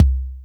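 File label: kick Rap, Alesis D4; 300 Drum Machines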